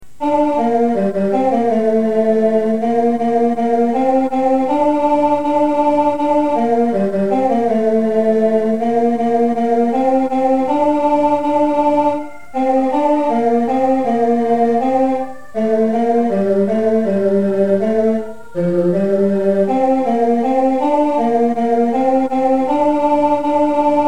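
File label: ding dong merrily tenor; Christmas; carol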